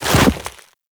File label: Walk Deep Snow, Footsteps; Warframe; Operator